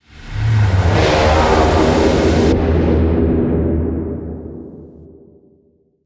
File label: Fly By, One Shot; Rapture vol1